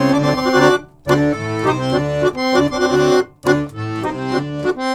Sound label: Balkanish Traditions; USB Soundscan vol.63; AKAI